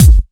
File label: soft hit whistle